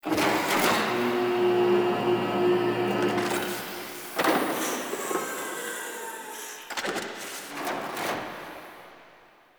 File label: Launch Turrets Retract; Rail Jack Dock